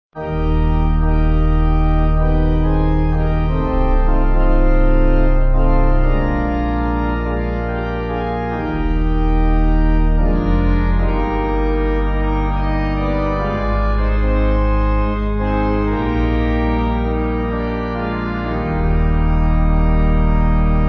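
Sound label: Pipe Organ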